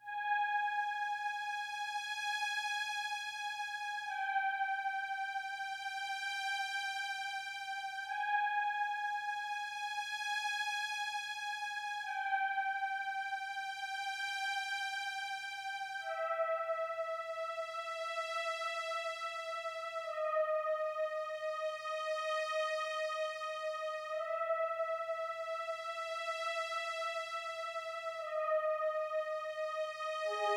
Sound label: Alien Space Dub